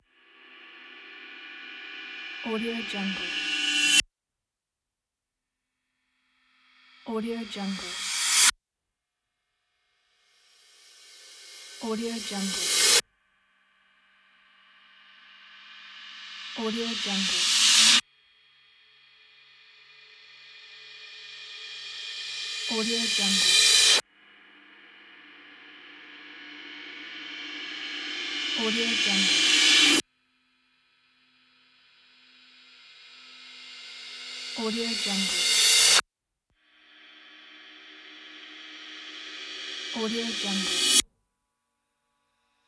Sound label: reverse cymbals